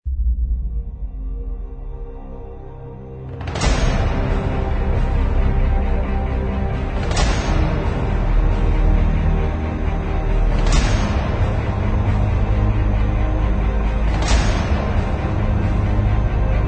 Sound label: Epic Trailer Spot, 它建立了一个巨大的预告片介绍与管弦乐和数字声音，包括一些合唱斯塔卡托和短弦乐模式; 音乐素材 史诗预告片 Epic Trailer